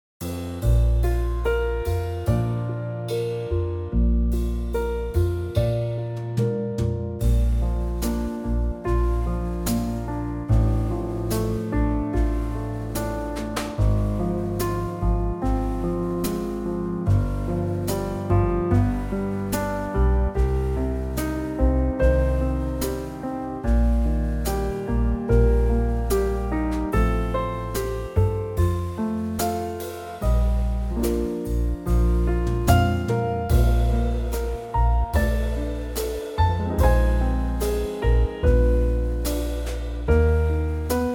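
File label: Bb trio, Backing Tracks for Male Vocals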